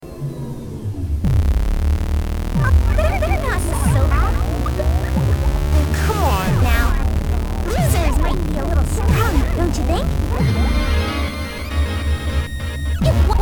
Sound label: less ringing version; The Ringing Lies